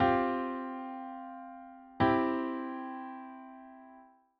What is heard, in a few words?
triad C sus4 C